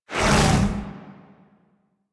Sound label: Slash Dash; Warframe; Warframes; Excalibur Umbra